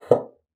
BASSOON; Xsample VOL-6

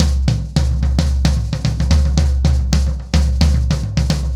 110BPM, SNARE TOM SOLOS

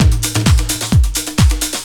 130 tribal, LOOP PERC TRIBAL; DRUMLOOPS

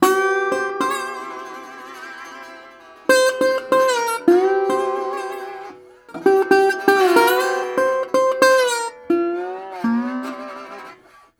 120, Blues Guitar; ILIO - Fretworks

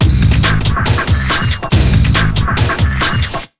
Big Beat Drumloops, 140; USB Soundscan vol.25; AKAI; TECH 140

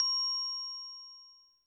percussion, Roland TD-20

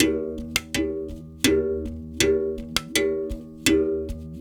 Tar, World Percussion

